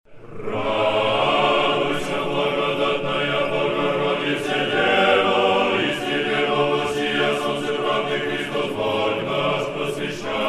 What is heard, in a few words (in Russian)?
Hor Tropar prazdnika Sreteniya glas; Hor Moskovskogo Sretenskogo monastyrya